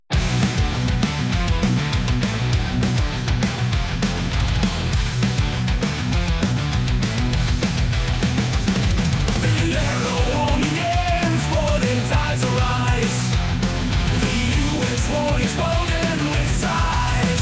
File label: Urgency Screams!